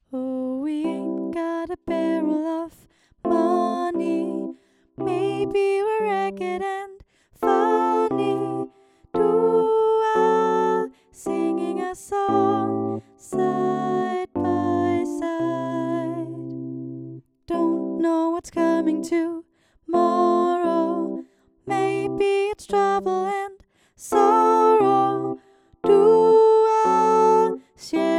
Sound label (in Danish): Sopran (med Klaver)